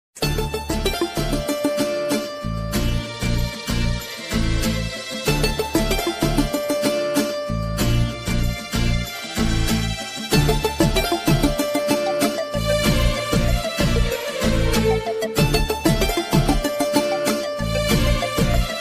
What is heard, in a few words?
odia ringtone odia love ringtone odia ringtone music